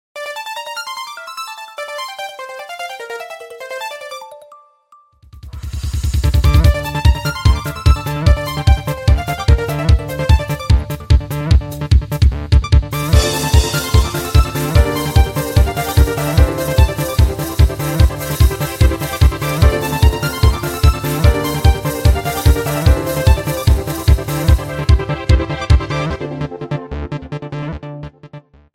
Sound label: electric eel